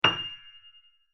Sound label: loud f7, piano